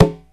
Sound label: CONGA SET; OMI - Universe of Sounds